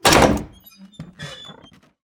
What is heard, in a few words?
metal double doors open